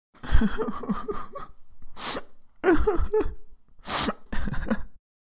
Male adult crying and whimpering

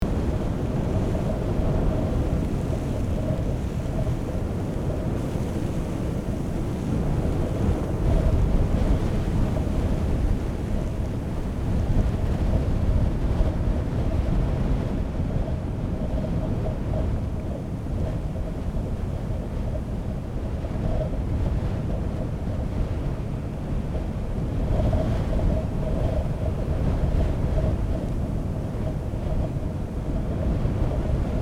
Rocky desert cliff atmosphere

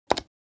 Infographic button

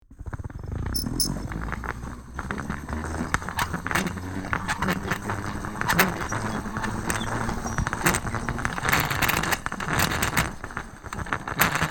Knife sharpener rotating; hand tool

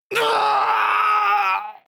Death shout anime villain vocalization

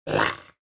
Monster vocalization

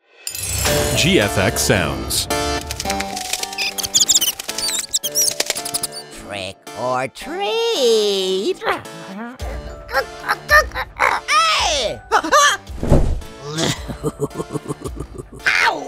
halloween nights sfx pack

